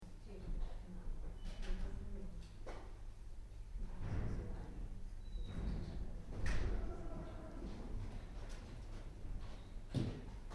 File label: Library, Interior Ambience: Small, Quiet; Building Sound Effects; Buildings (Sound Effects:Backgrounds); Stuart Duffield